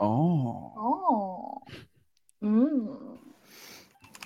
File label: ohh